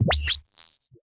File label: holographic flicker a qui, display